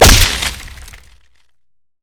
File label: stacked deck; LoL; twistedfate